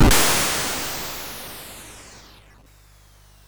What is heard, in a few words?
Frantic, MOS6581R4